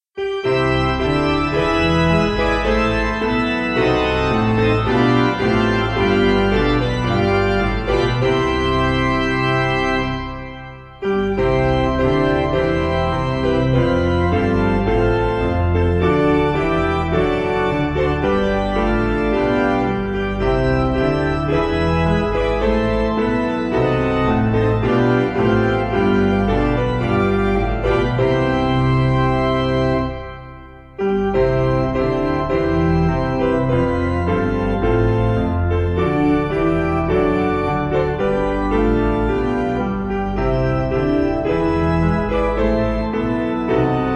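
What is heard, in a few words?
Organ Piano; Exalted By Thy Fathers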